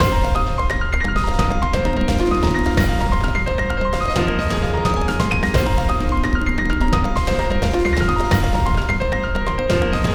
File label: Crescendo (Rune Critical Hit)